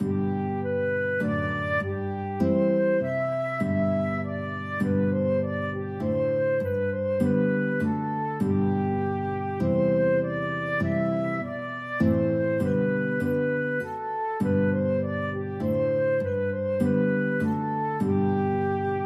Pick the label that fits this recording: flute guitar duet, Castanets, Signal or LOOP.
flute guitar duet